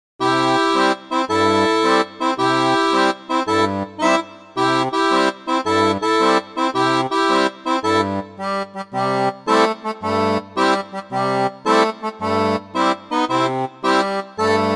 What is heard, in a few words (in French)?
orgue barbarie